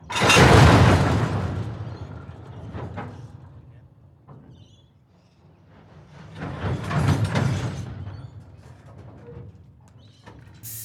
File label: Rail Cars Slam Hard And Couple Together Air Released